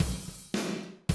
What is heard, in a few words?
Classic DrumLoops, 110